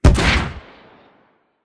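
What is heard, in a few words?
drone death explosion distant; Titanfall 2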